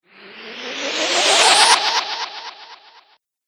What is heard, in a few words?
Magical Air